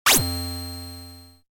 Pickup: Generic Pickup SFX For Collection Of Item, Video Game; Video Games; Video Game Sound Effects; Video Games (Sound Effects:Games); Stuart Duffield